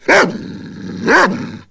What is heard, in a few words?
attack, dog